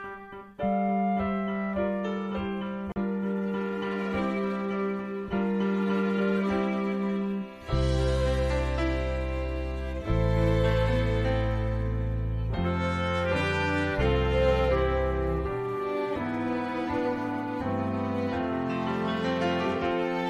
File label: Instrumental